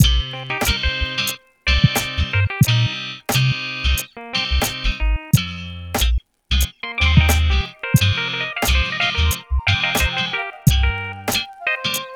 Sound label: Contempo Jazz